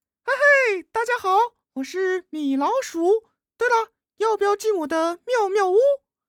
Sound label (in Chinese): 笑; 米老鼠